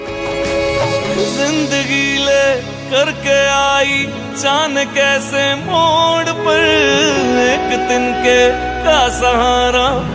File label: Song